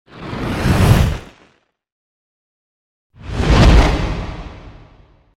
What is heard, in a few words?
Fire - Big flare by x2; Hollywood Edge Sound Designers Tool Kit